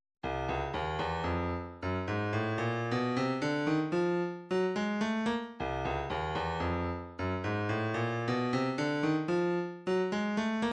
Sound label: 165 snare